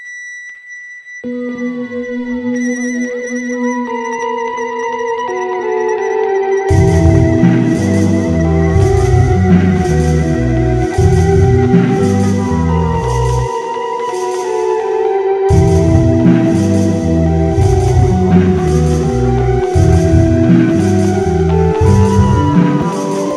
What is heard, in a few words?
Sinewave